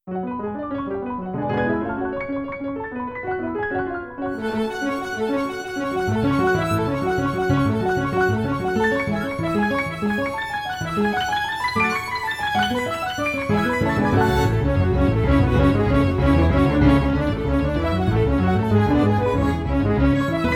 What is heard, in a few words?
Piano In A Storm